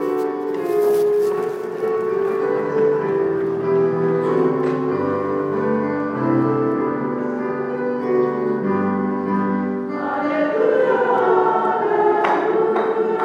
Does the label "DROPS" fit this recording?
No